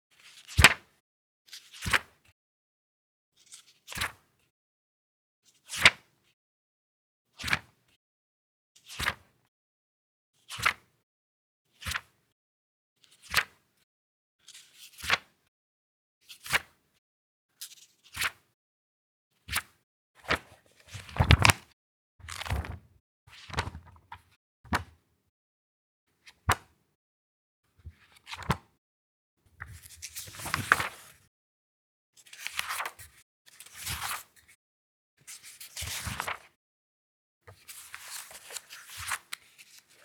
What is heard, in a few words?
turn paper album fast